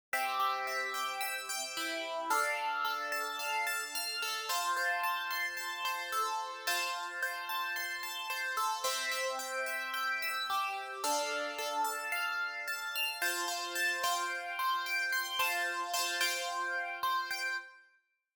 clav arpeggiert; Logic Pro 11; Session Keyboarder

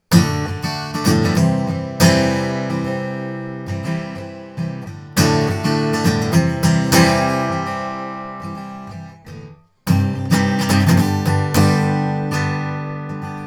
Warm Audio WA-19 Akustikgitarre WA-19 t.bone SC400

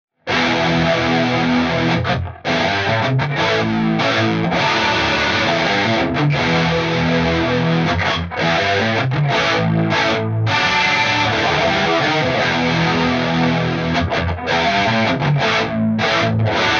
DI Aufnahme Micropitch; hinter NDSP Petrucci

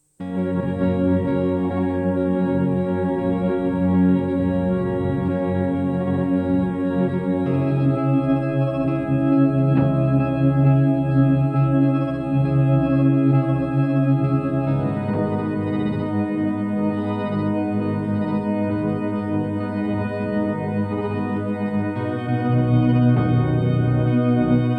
Freeze und Glitch mit Error; Chase Bliss Onward

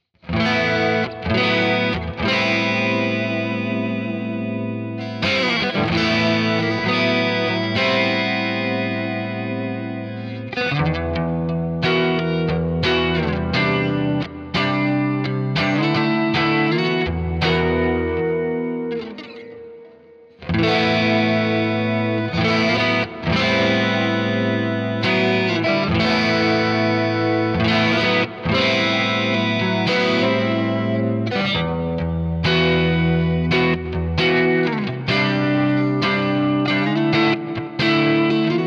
Blackstar Polar 2 Clean ab 20 Sek Enhance Modus

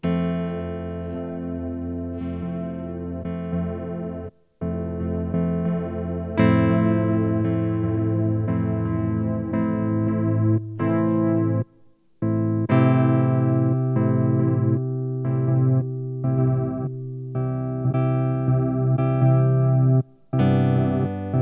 Chase Bliss Onward Glitch mit Error Modulation

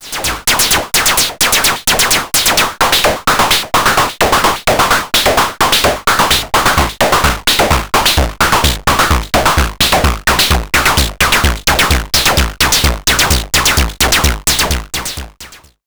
Reglerfahrt; Body Synths Metal Fetishist

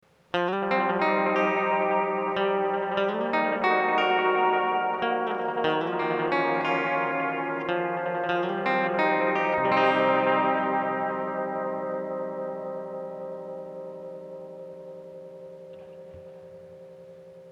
Binson Echorec E Gitarre; T-REX

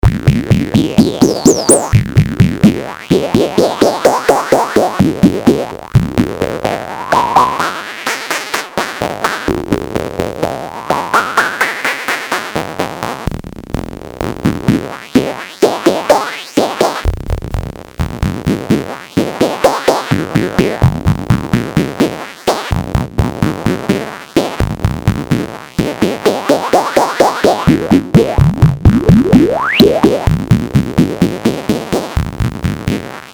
FM Sequenz; Behringer Proton

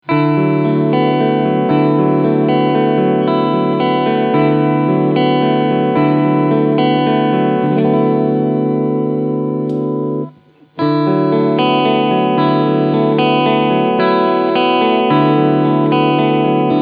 Clean alle Positionen

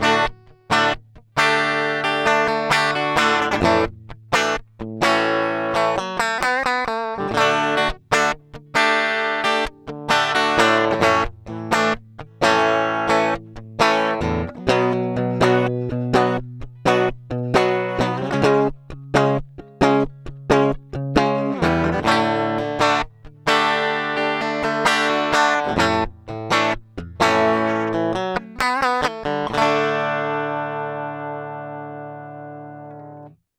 DI Aufnahme UA Volt 476 Comp an DI Signal

